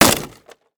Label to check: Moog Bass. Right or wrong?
Wrong